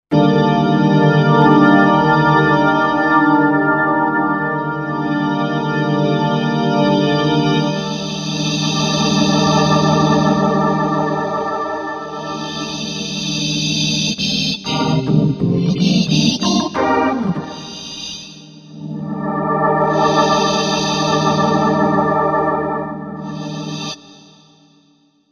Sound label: Hammond Transistor Leslie 710